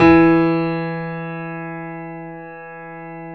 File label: E 2, Keyboards Acoustic, GRANDP ST; USB Soundscan vol.17